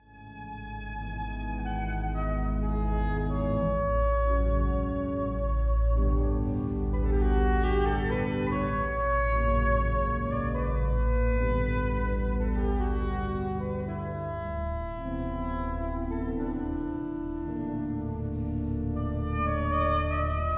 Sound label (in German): Sax Orgel; Children Songs No.19; Friedenskirche